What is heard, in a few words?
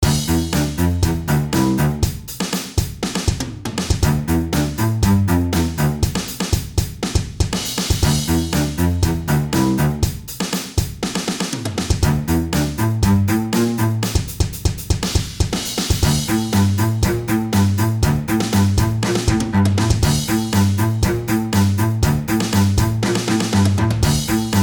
sans Guitar